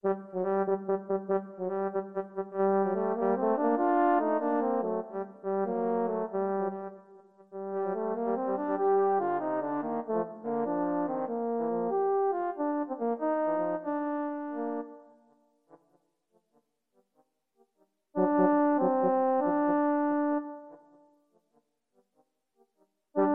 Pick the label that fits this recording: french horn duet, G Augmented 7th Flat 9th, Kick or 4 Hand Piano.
french horn duet